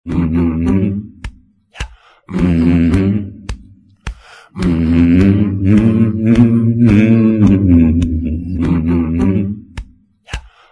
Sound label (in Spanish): hum hum hum; Tono para tu móvil; EL LOBO DE WALL STREET